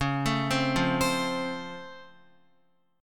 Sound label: Db Augmented Major 7th, down, Guitar; arpeggiated; JGuitar